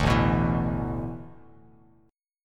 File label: A Suspended 2nd Suspended 4th, Bass Standard